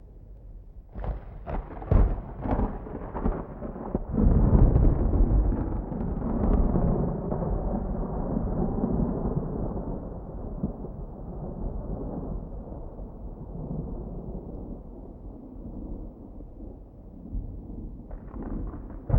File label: Thunder in distance